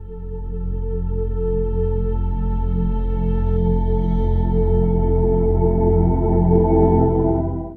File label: Title Sweep